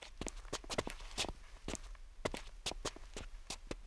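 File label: mattress steps; kroky z matek; Mafie 1 cz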